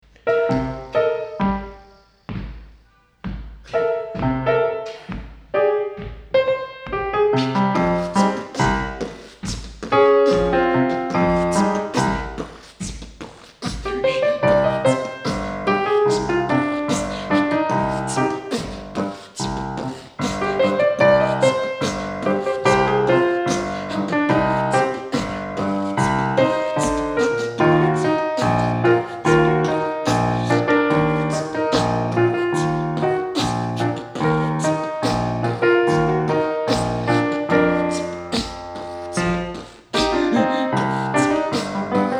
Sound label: Pianoforte and Beatbox